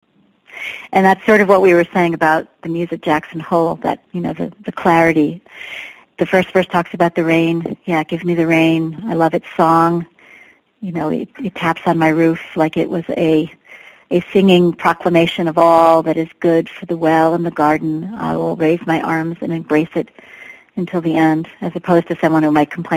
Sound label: SPANISH LYRICS